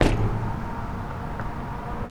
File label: Sewer Cover, percussion; Third Ward; Emancipation Park; Houston